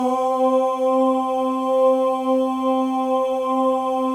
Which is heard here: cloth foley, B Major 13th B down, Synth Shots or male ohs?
male ohs